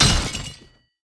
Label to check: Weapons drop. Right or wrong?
Right